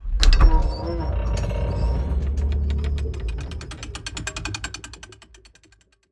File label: seesaw start; fallguys